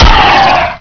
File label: shot